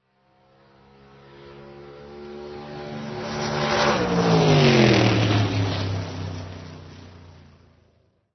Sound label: AUTO RACE DRAGSTER